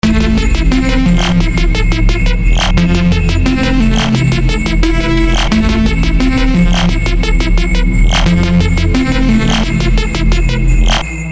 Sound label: Jump Up Songstarters; Kulture